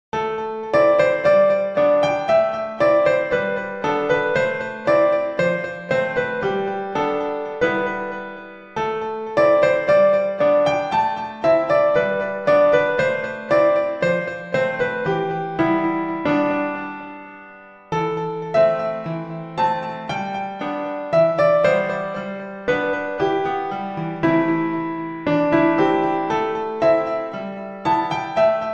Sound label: Piano etude